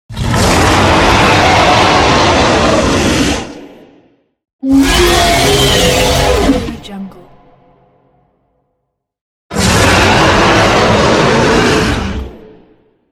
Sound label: dragon